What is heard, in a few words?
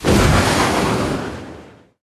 Juggernaut Liftoff Takeoff